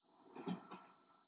train

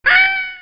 scream

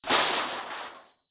crash

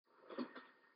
train

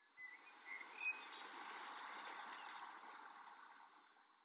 forest fade out

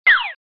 fall in

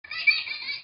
BABY Laugh

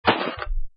splat

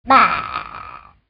Witch baa

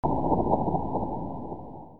bowling ball

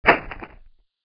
rope snap

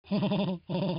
laugh; peter griffin

